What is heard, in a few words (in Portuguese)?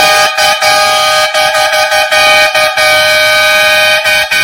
buzina